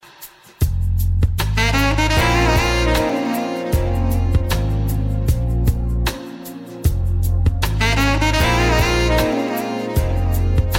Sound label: Sax